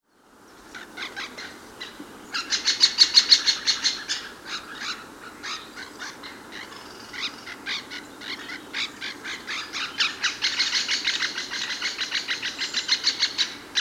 Buntspecht Rufe

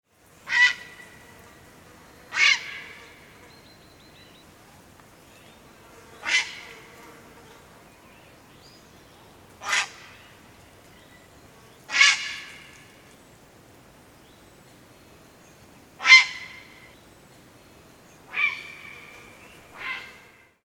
Graureiher Flugrufe

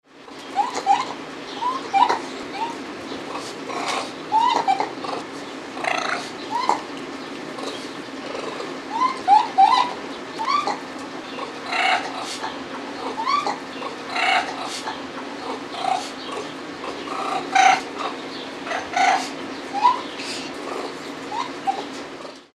Waschbaer Paar Rufe

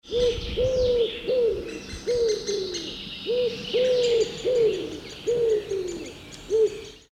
Ringeltaube Rufe

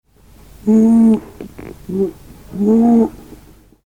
Biber Alttier Kontaktlaute

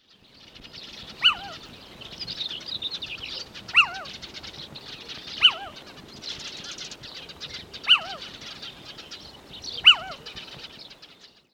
Steinkauz Rufe

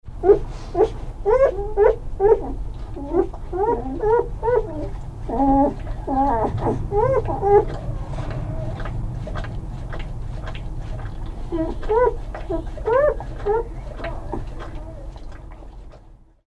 Wolfswelpen

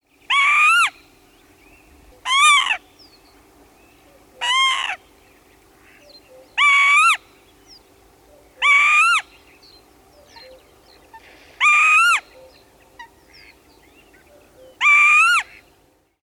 Kiebitz Rufe

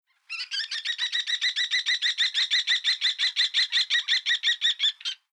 Turmfalke Rufreihe

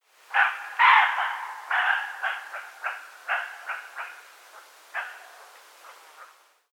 Reh Schrecken

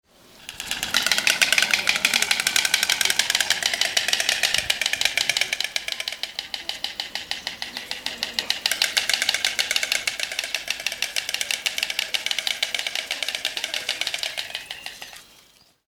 Storch Paar Schnabelklappern

